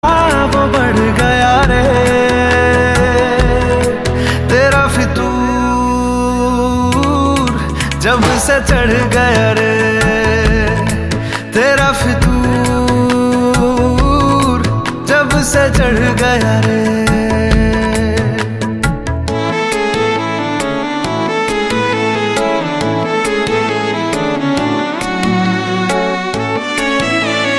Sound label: bollywood ringtone